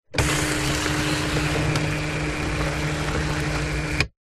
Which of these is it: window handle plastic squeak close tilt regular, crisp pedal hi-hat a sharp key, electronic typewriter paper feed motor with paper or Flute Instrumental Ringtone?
electronic typewriter paper feed motor with paper